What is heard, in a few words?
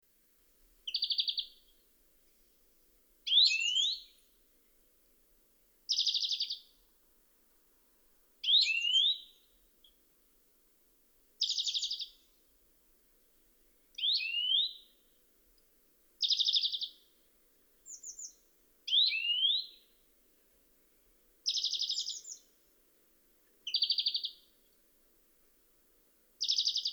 Chloris chloris; SETE